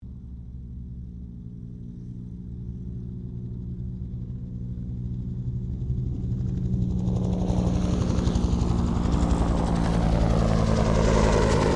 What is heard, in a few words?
HELICOPTER 1967 BELL UH1 IROQUIOS PASS